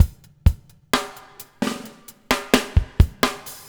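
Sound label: BUBBLE left; Spectrasonics - Backbeat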